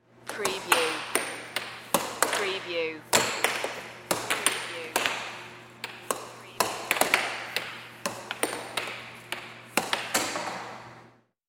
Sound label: Air Hockey